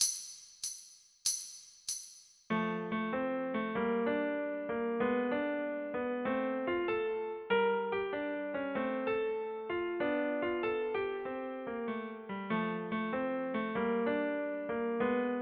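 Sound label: Homework Blues 96, Pianoforte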